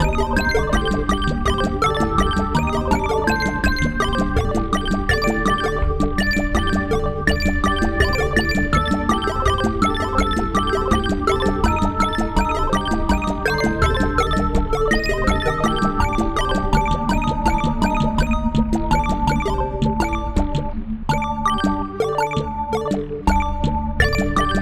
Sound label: Video Game